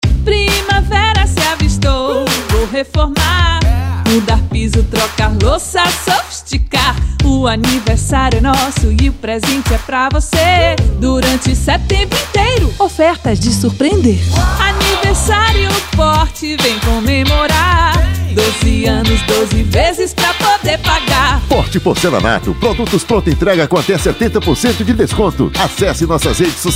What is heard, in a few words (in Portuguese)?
jingle